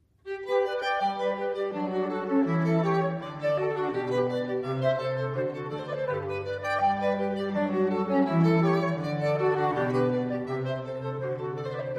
Canon in unisono post semi fugam a 3; Johann Sebastian Bach

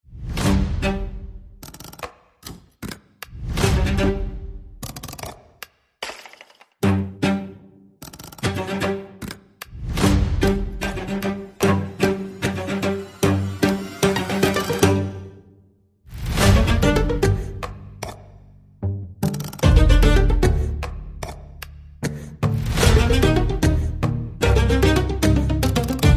Strings Percussion and Effects

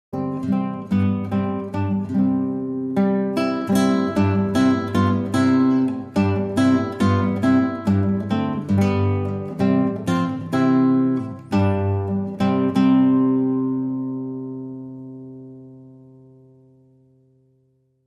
Solo Guitar

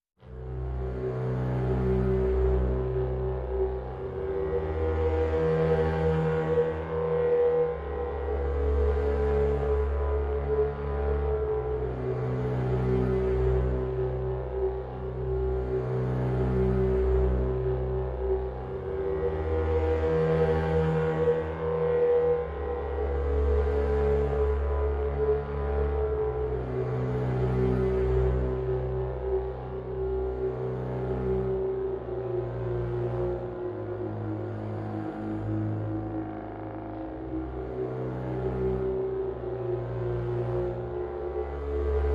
Murder Mystery Bass Mix

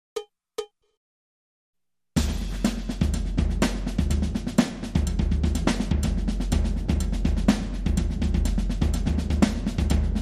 Marching Band Loop

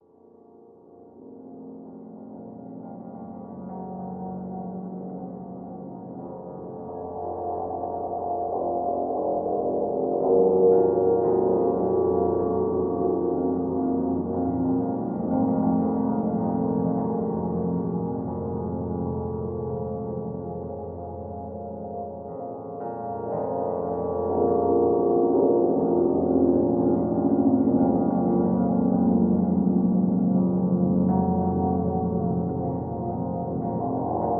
Frailty, Pads Only

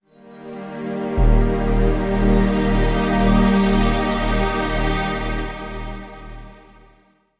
Link Sting

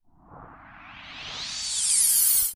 Sharp Transition

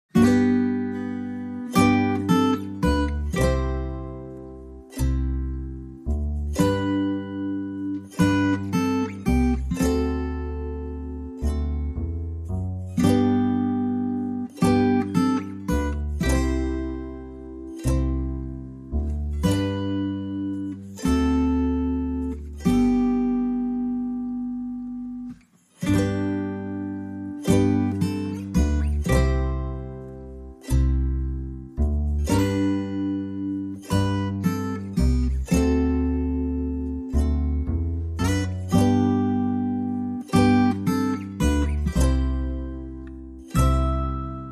Guitars and Bass Only

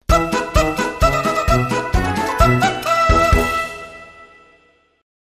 Rubberkazoo Band, Sting

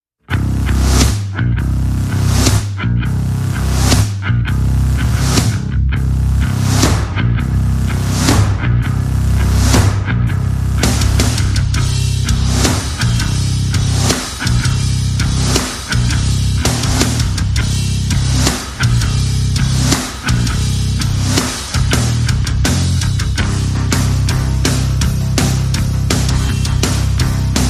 No Guitar

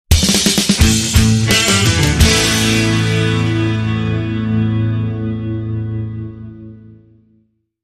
Blues Rock Ending

